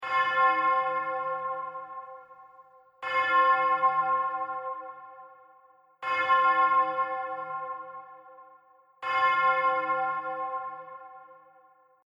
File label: Church Bell Loop; Musical Sound Effects